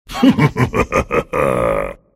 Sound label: dismember laugh; pudge; arc ability